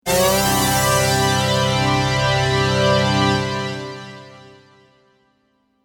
Synth Stab, Cheesy synthesizer stab in the key of G; Musical